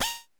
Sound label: DRILL; Central Processing Unit - Electro Samples Vol. 1